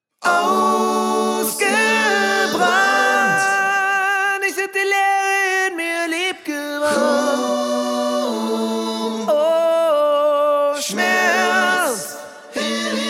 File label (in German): acapella